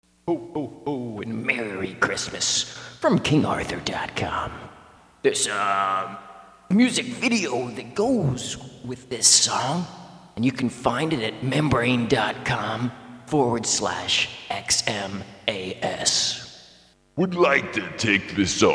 Ambient Version; Christmas Music

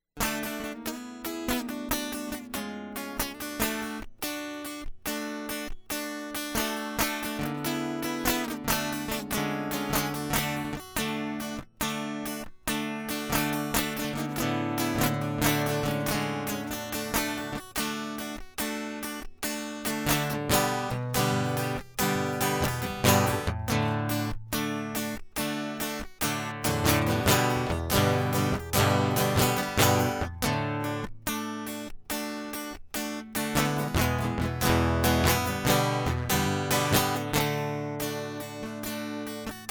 Swing Loop; hyper terrestrial

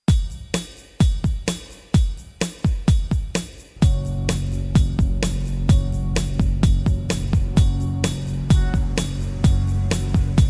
Anthropologic Music Interlude on Keys; hyper terrestrial

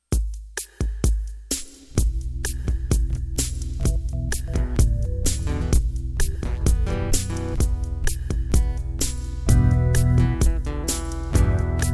Sprain a Leak Part II Instrumental; hyper terrestrial

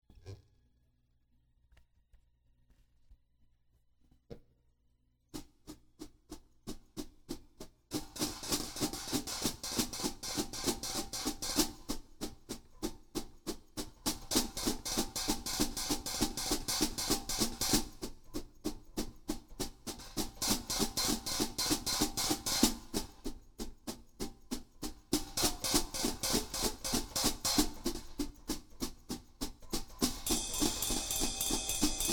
Drum Interlude; hyper terrestrial

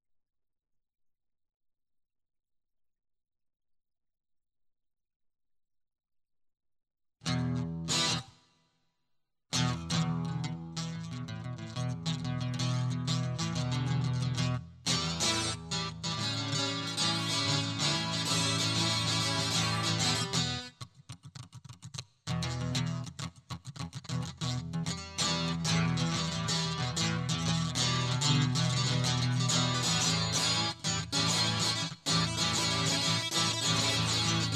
Guitar Loop

